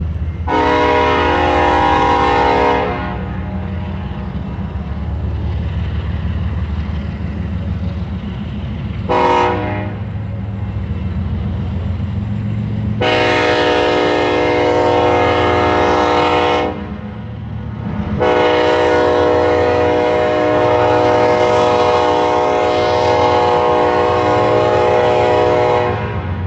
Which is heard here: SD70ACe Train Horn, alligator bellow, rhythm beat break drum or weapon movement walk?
SD70ACe Train Horn